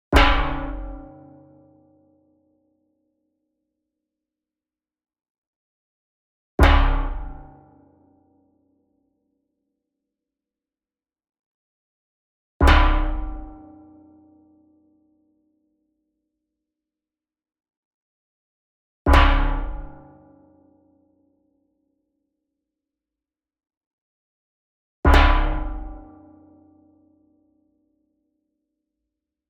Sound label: Metal Pan Flam Near; SWEETENER; boom library; Cinematic Strikes Construction Kit